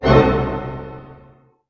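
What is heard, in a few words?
D# diminished pianissimo, orchestra hits; Sound On Sound - Peter Siedleczek's Orchestra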